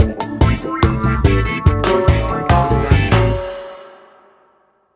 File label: Cool Reggae Funk Guitar Fusion Music Stinger